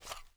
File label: m3s90 get ammo; weapons; garrysmod; m3s90p